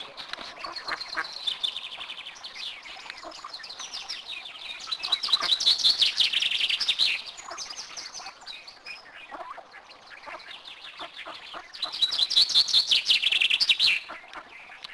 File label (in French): canard + oiso